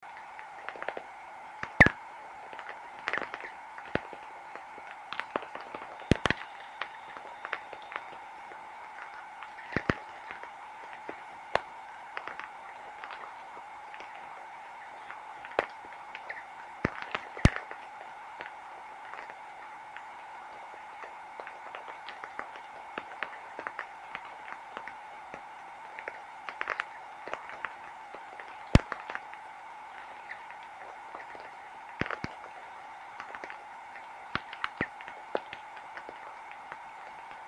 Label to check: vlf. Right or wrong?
Right